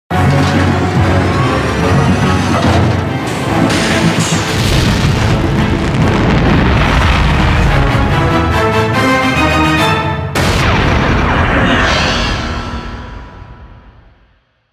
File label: Mission Failed; Mission 10; G.F.S. Aegis Destroyed; Metroid Prime Federation Force